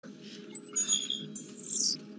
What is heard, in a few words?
hand link scan